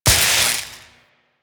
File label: Vial Shock Shatter; Warframe; Alchemist